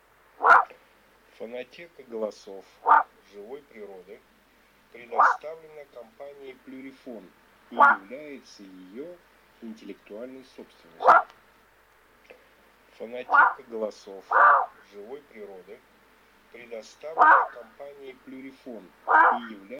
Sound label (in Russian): Лай лисы Volpe abbaio